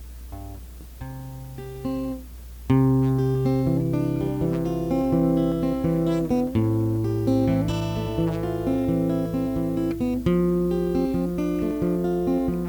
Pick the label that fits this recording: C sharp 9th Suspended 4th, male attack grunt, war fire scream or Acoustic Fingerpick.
Acoustic Fingerpick